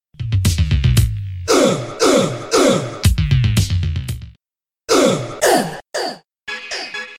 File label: Shout ('uh')